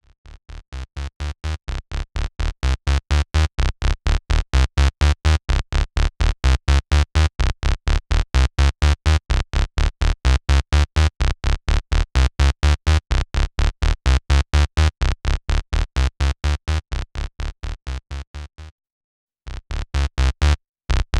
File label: SH Pulse Width Saw; sh101; sh101 sample pack